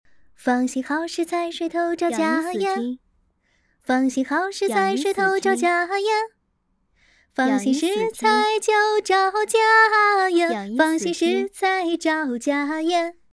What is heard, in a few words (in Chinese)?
唱歌